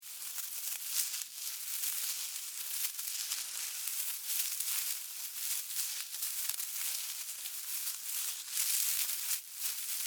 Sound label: Animal, Critter, Movement, Grass, Foliage; Flies; BlastWave